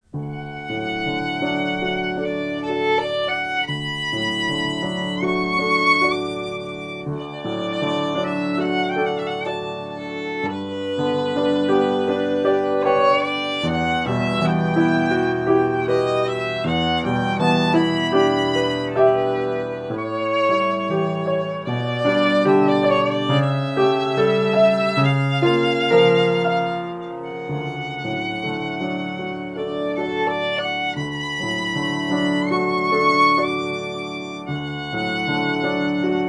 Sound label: meditation